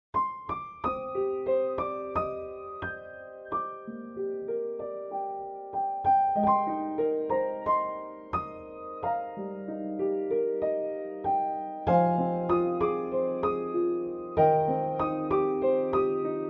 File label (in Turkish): SANTRAL MÜZİK